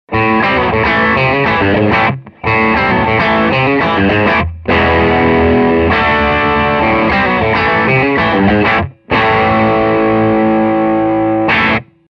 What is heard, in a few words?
Hollow classic bridge, pickups; Hollow Classic VA2; Rockin, Bridge pickups